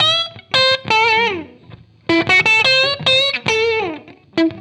BLUES GUITAR

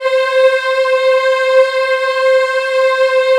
C4 WOM EH, Choir; USB Soundscan vol.28; Acoustic & Synth; AKAI

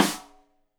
Rim Shot Stereo; Deeply Sampled Drums; SMDrum

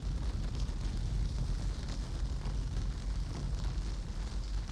Ambience Loop, outdoor

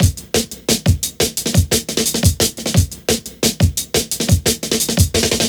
Funky Mule 175, classic dnb breaks

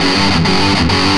Riff (lick), Industrial, Nasty

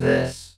perc voice, Roland MC-909

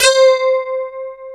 DS8 C 4, Korg

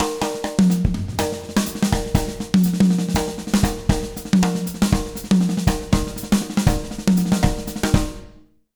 BEAT, Jazz Latin Drumloops; 120 BBEAT; USB Soundscan vol.08; AKAI

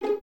VS G#4 Pizz, String Ensembles, Violins; Miroslav Vitous; 11 Violins

